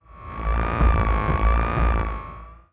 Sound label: shield battery recharge; starcraft; protoss